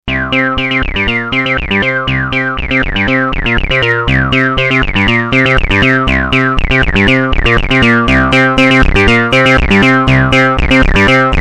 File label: Reso Distortion; MBS 100